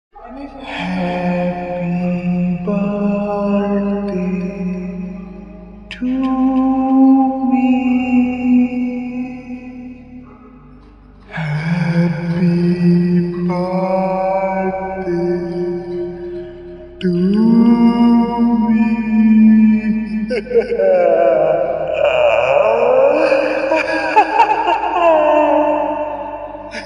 creepy girl singing happy birthday to me scary horror voice